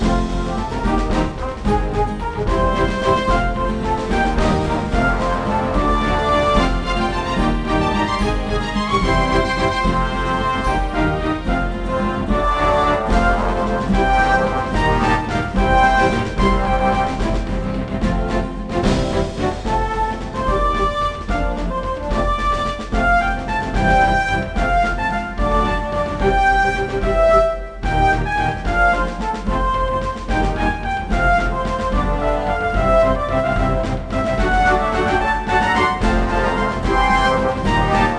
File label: Duel Music Good